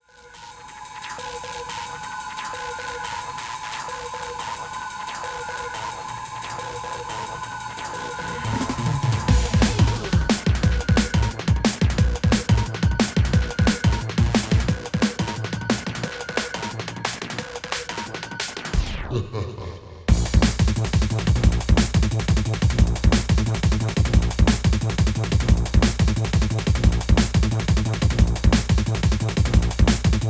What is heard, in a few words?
strobing no snare